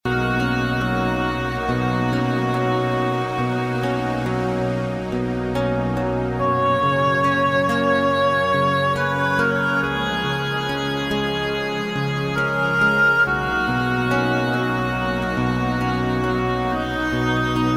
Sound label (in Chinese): 轻快的声音